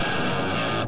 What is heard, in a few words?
guitar, heavy distortion guitar